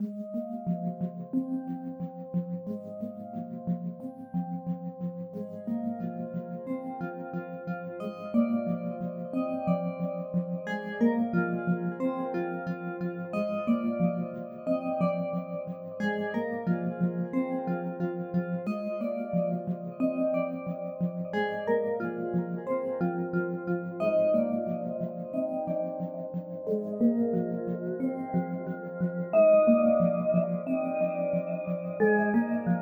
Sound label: pause loop; SPLOOSH ( + )